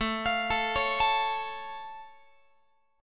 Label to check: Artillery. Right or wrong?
Wrong